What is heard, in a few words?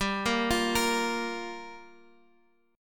G Minor 7th G, Guitar Half Step Down